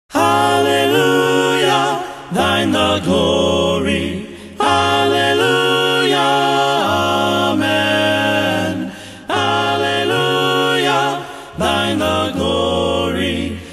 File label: A Cappella